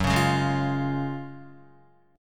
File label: F sharp Major 7th Suspended 2nd, Guitar; strummed; JGuitar